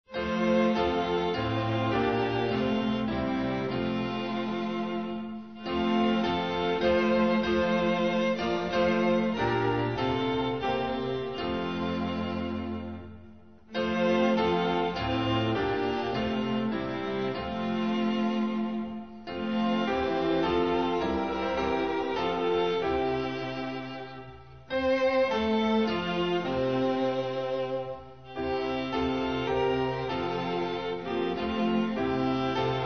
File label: glory to god on high instrumental; hymns portuguese